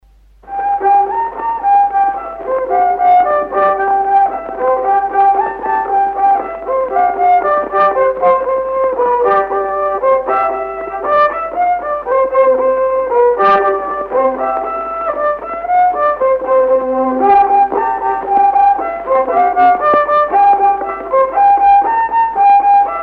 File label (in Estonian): Siiripolka